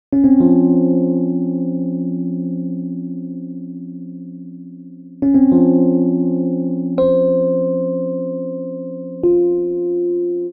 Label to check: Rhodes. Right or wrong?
Right